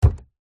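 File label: foley footstep single on wooden floor barefoot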